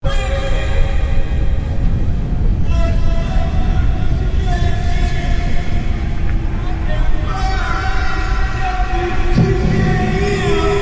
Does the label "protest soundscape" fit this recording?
Yes